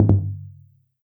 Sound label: caution